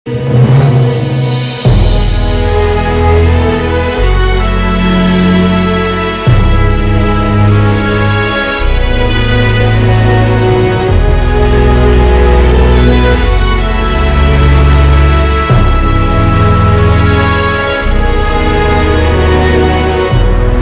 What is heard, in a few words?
Orchestral; Bollywood; Aurangzeb